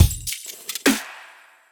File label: Drumloop, Dubstep; GhostHack